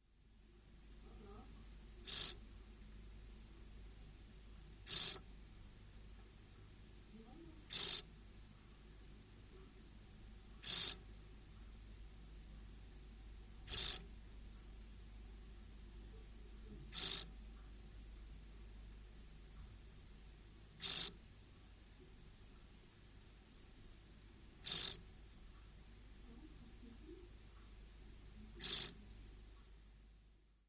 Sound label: field grasshopper